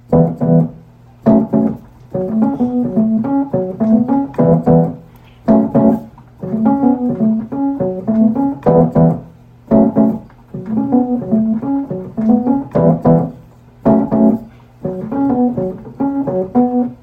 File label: wurlizter voice memo idea